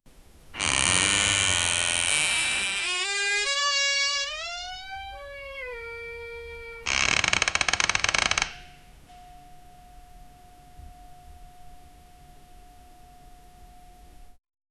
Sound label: a very creaky castle door